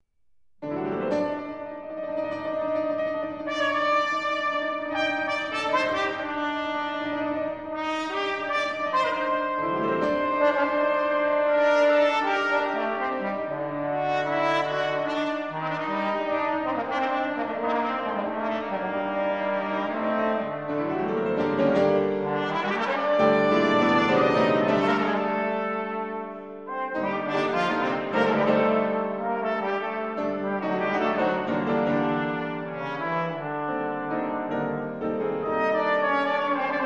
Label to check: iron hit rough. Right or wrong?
Wrong